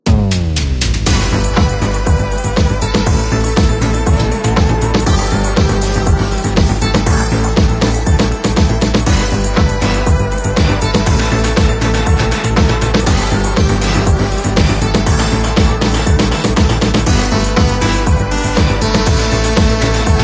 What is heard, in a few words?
bigwin intro; aviamaster; Aviamasters